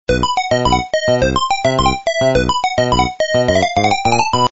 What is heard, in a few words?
music; pacman